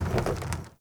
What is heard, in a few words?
Pylon Plastic Roll; Warframe; Ambience; Nineteen Ninety Nine